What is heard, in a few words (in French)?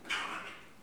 bruit animal